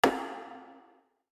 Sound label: PERC